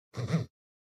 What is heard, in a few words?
Cell Phone Vibration Cushion